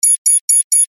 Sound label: signal